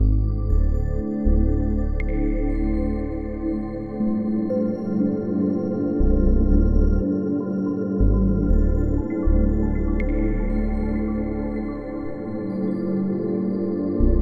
shimmer

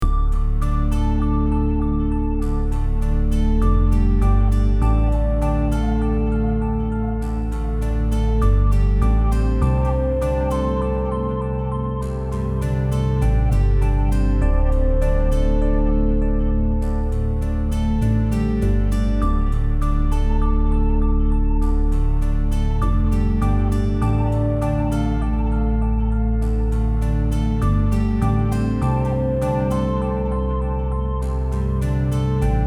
Space Background Music

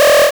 Powerup

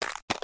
ice

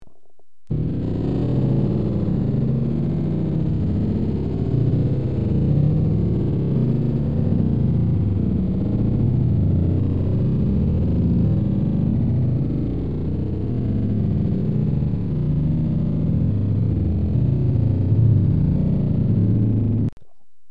engine sound

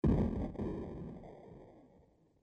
explosion

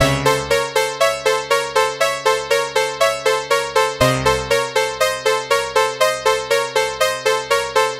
suspense

